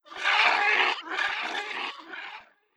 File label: Hydra Vo